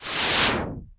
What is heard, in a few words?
cinematic impact wipe